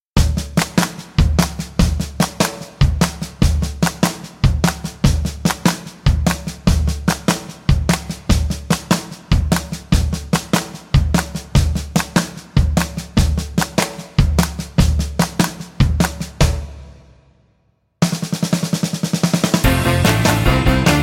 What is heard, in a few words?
C No Backing Vocals, backing tracks